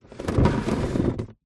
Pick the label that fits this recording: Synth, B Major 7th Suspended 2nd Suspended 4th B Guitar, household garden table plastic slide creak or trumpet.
household garden table plastic slide creak